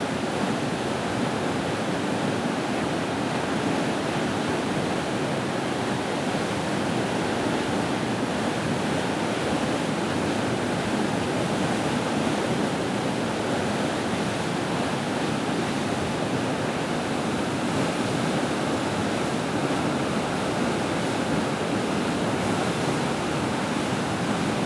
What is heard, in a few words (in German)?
almkanal welle; spuren